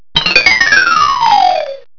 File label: XYLO DOWN